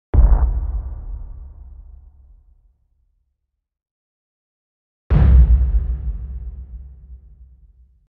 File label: Bass impact - Low drum, with long reverb; Hollywood Edge Sound Designers Tool Kit